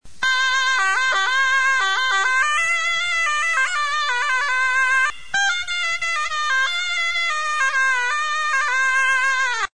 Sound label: zurna